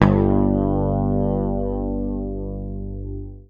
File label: PICK, Bass Library